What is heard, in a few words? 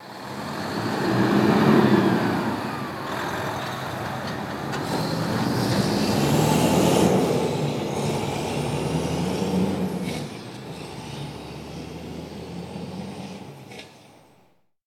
Vehicle Transport Truck Drive By And Shifting